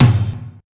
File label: tom; ze house of prayer